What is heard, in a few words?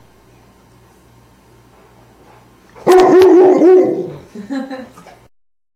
Woof; Daisy